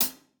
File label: Drum Snare